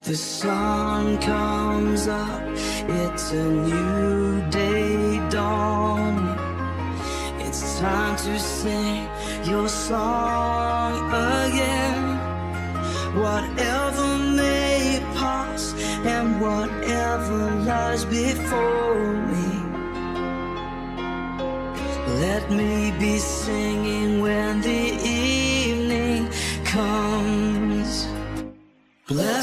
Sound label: Drill Version; Naijaremix